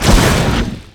attack; dota; ancient apparition

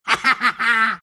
alch laugh; dota; alchemist

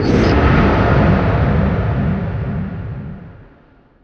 bulldoze; spirit breaker

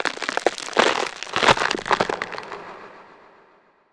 overgrowth target; treant

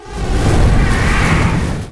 echo stomp channel; weapons; dota; elder titan

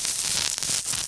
acid spray damage; weapons; dota; alchemist

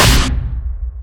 follow thru, large blade; dota

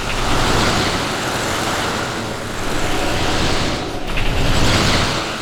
spirit loop; movement; elder titan

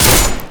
attack, weapons; dota; kunkka

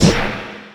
impact; dota; dark willow

